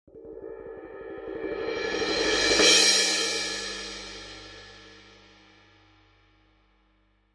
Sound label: K Constantinople Suspended, cymbal